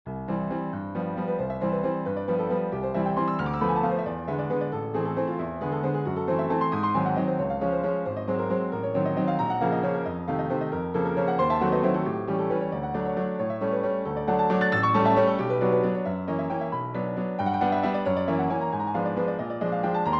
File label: Modulation waltz